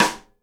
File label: SIDE STICK, Live Snares